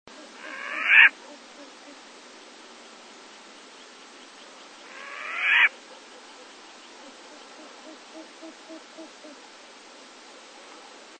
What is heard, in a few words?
asio flammeus; Przyroda ptaki i zwierzęta